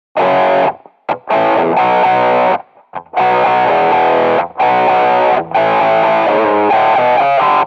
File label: Intro Guitar